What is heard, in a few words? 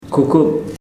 cough